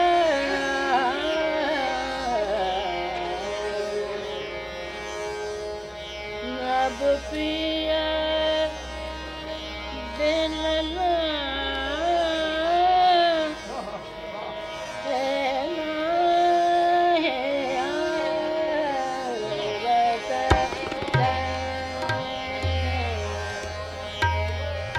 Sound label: Thumri Tilak Kamod